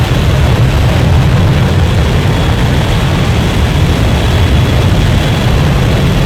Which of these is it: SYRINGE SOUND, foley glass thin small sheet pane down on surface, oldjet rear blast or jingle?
oldjet rear blast